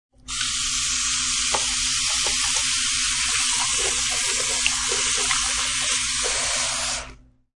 Washing hands in faucet.; Human

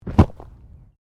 Body movement Fall Heavy

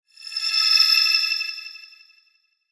Sweeper Ring High; Production Element Imaging Element Accent Transition; Sweeper Sound Effects

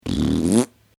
Blowing A Rude Raspberry Funny; Fart Sound Effects; human sound effect

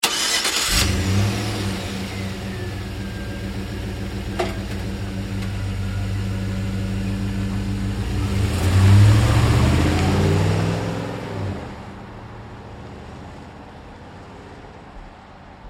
Truck GMC Savana Start Away Med; 2010 GMC Savana; Trucks; Vehicles; Big Room Sound

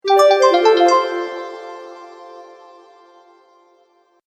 News Message, Cartoon

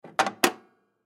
Oven Side Compartment Close Door Antique; Household Oven Side Compartment Close Door Antique Appliance; Appliances

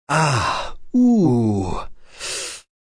Aah Ooo, Exclamation, Male

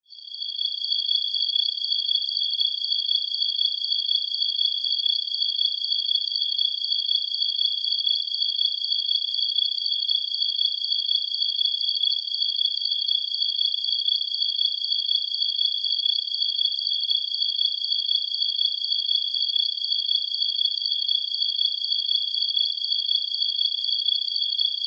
Crickets

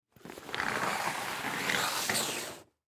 Hockey Bag Empty, Hockey Bag Empty Slide Cement; Blastwave

